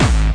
hardcore happyhouse kick; Kicks; single hits

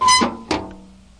squeaky box; Weird and Interesting Hits; single hits

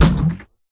fx drum

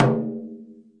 miyadaiko B1, Japanese Drums; ETHNIC and WORLD PERCUSSION

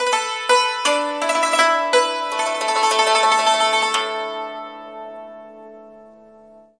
koto sounds; WORLD

